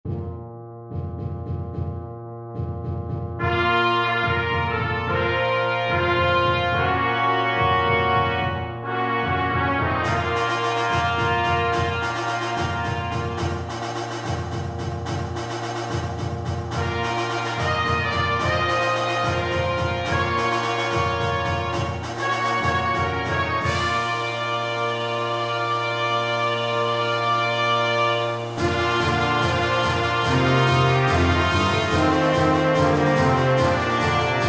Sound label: harvest prep fanfare; onward